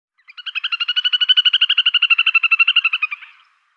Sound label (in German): Zwergtaucher Tachybaptus ruficollis, Vogelstimmen